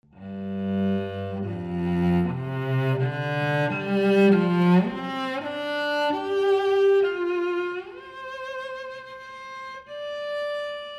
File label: Cello, Large Wooden Room; Bricasti